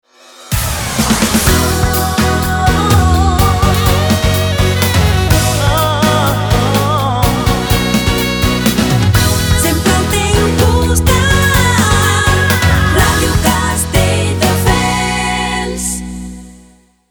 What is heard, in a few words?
JAZZY